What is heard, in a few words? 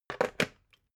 water boiler put down slow